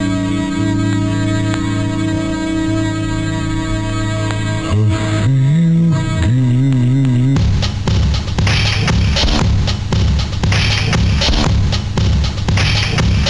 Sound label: explorer, Vaporwave; Vaporwave Library Project